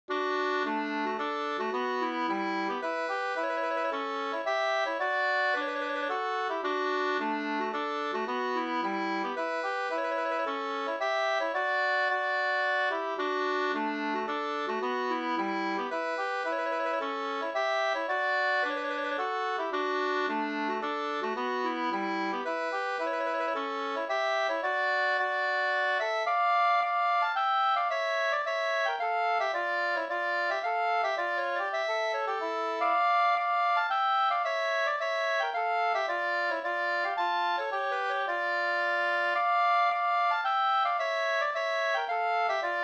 polska Bodapolska I dur och moll

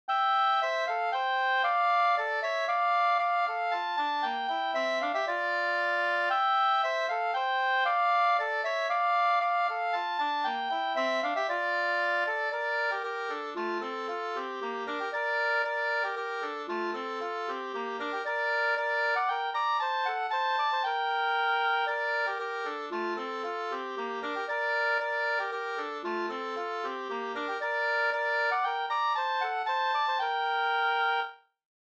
polska, 2st; Bara flickorna far rada

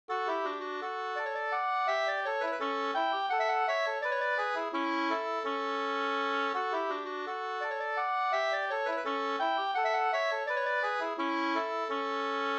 ganglat; fr Bingsjo e Hjort Anders

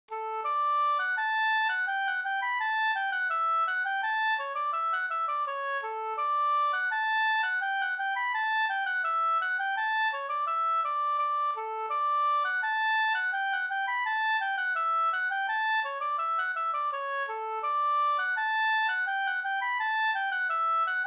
skanklat; Begavningsmarsch

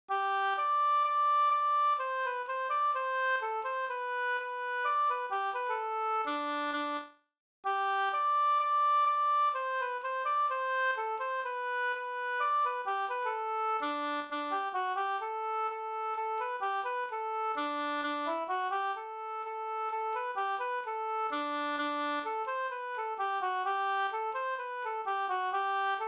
skanklat

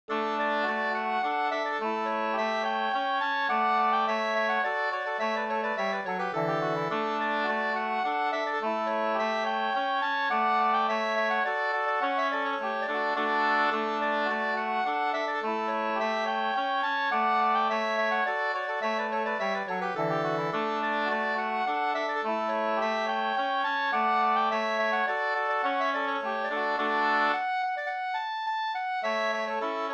slangpolska

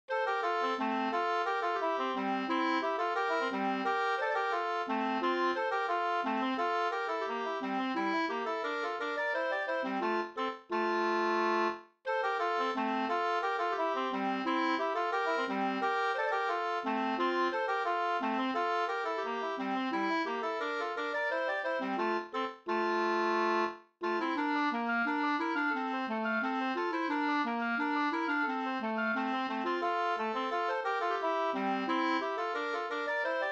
polka polkett

